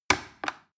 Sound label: plastic button little click heavy slow